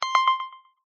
exploder timer; gardenbloom

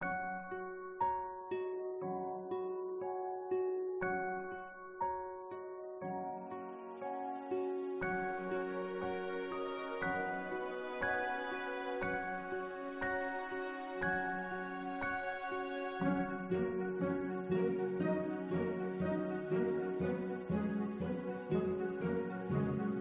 main ost; gardenbloom

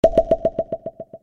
monster power active

